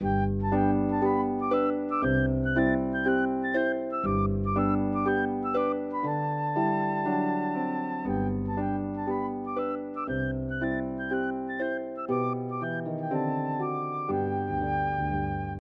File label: humoresque, flute and harp